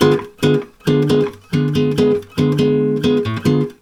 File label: D DIM GTR, Brazil Bossa; USB Soundscan; AKAI